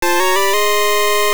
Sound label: Fanfare Item; "Get Item" fanfare (original and extended versions)